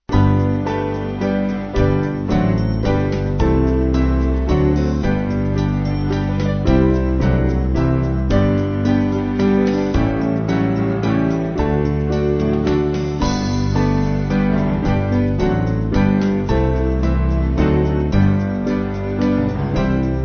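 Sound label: band, Music Backing Files for Churches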